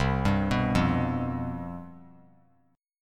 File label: C Major 7th Suspended 4th, down; arpeggiated